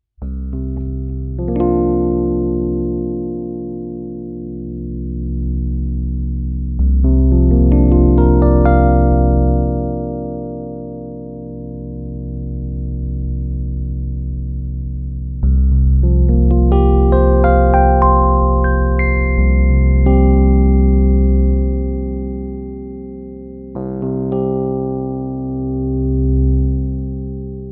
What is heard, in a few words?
EQ Bass; Ferro